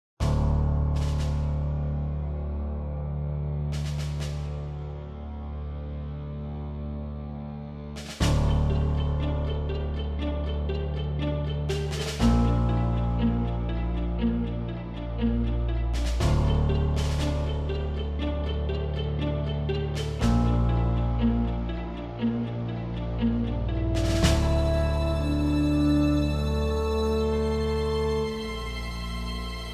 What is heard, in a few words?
Menu music; rogue slash